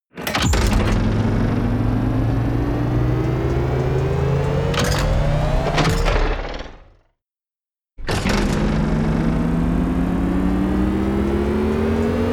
turn on off

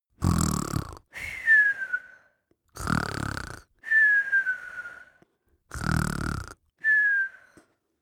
goblin sleep snore whistle; koerpergeraeusche; comedy-cartoon; soundpool

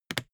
briefcase plastic latch lock close unsteady; industrie wirtschaft; bueroarbeit

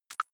click hard low, signale

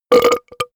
monster burp; comedy-cartoon; soundpool

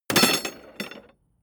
metal tools drop; berufe; handwerker; soundpool

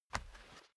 step barefoot wood plank single right; schritte

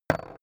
metal small wood put down short; industrie wirtschaft; metall

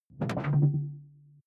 sci-fi alien tech; swooshes-swishes

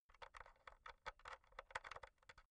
insert usb b rattle, elektronik; objekte

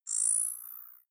zoom in light

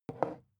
glass put down heavy slow; objekte

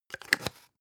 spice jar big scratch close long; industrie wirtschaft; gastronomie

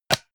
mechanical switch wood small push; industrie-wirtschaft; mechanik

